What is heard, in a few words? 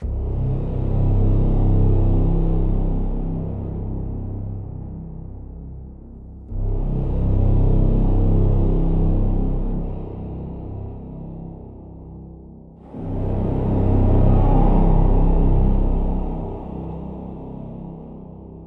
hell music; cyrax